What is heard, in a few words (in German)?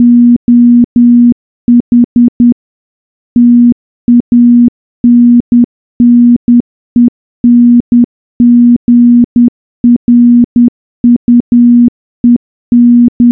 Glocken